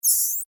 Insect Voice Scream, Insect, Voice, Scream, Vocalization, Bug; Animals:Insects